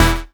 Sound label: Synths E; vengeance essential club sounds-3